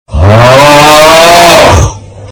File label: auuuuuuuuggh bass boosted